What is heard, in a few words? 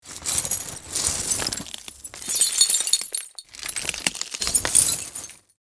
Small glass debris shards movement